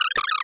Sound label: Beep